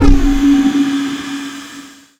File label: waning rift; weapons; dota; puck